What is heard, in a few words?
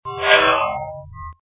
noise; massachusetts; middleborough